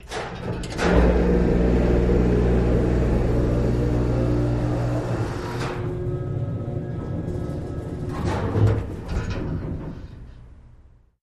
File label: Utility Elevator, elevators